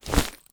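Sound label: bash, super shotgun; weapons; doom; ssg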